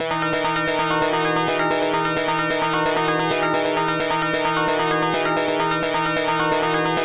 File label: ARP GTR 131, SYNTH SEQ, Trance; AMG; Global Trance Mission VOL-2